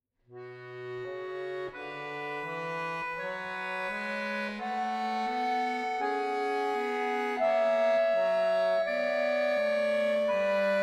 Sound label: Prelude in B minor